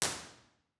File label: theatre bformat IR; Individual IR Takes; OPENAIR